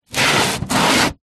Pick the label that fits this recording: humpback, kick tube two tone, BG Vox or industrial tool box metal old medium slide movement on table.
industrial tool box metal old medium slide movement on table